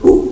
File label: jump; opentdm